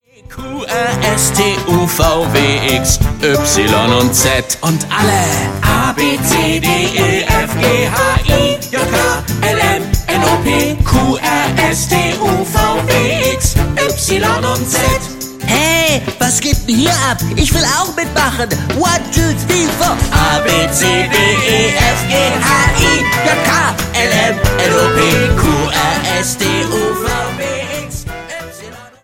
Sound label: abc rap